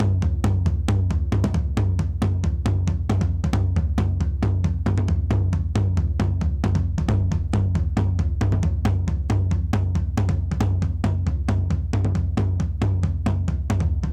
toms 136; 8A; 135.78

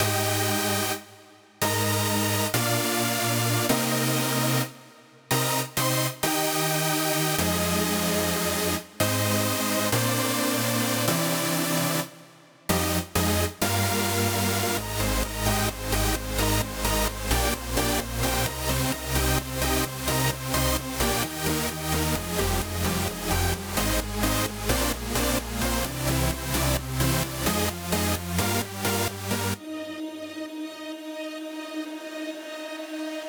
Main Synths

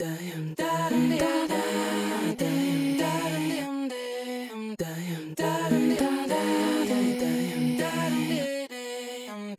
Effected Vocals Chorus; Multitrack

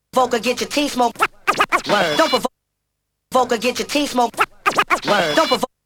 Vocal Scratch; Multitrack

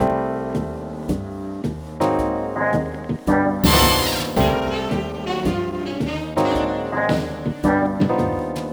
Misc C 110 bpm; ElectroSwing; WSL-ElectroSwing Vol 4 Additionals

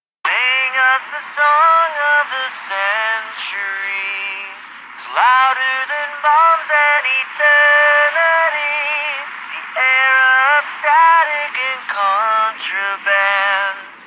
vocals

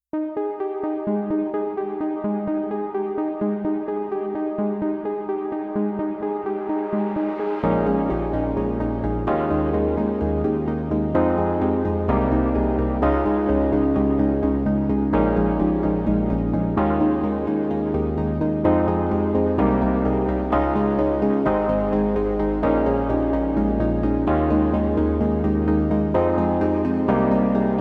E Pianos & Plucks

